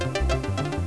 Short Up; HelloWorld8Bit

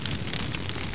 torch; dosgames; roc

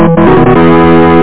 TUNE

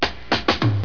KICK

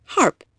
harp

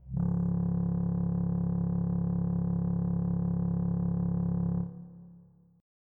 humm; laser!10

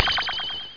new shot; cool spot

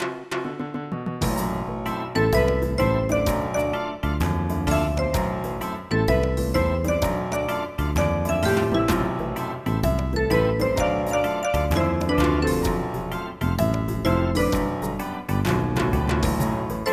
Reggae

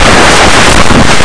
book

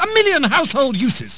string

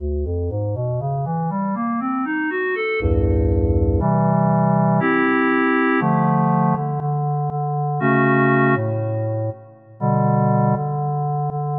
Clarinet; Onj's Soundfont

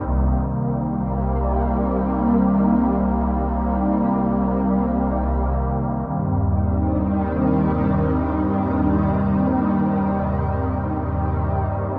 synth pad ambient 160 C; Sphere

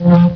CHIME

